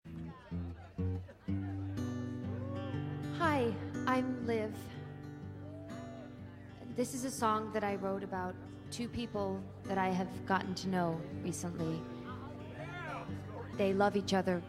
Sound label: singing; Rose McIver